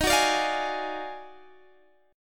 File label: Eb Minor Major 11th; EbmM11 strummed